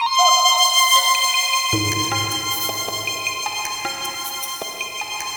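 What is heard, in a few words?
wave sequence, synthesizer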